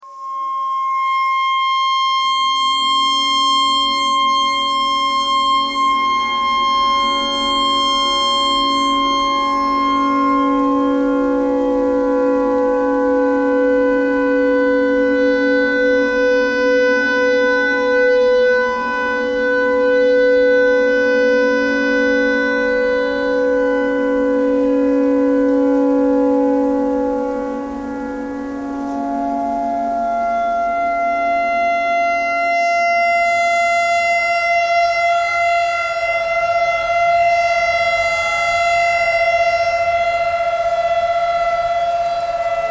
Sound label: tornado siren clarinet notes e noise